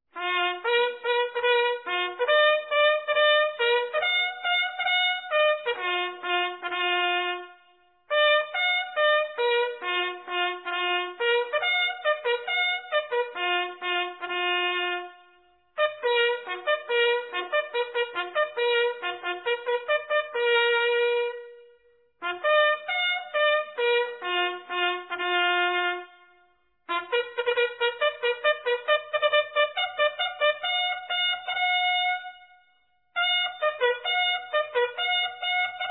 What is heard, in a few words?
1st Post (Tattoo), bugle calls